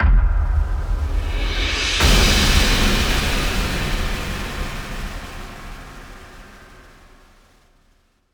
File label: Stinger Victory Panel; starcraft 2 wings of liberty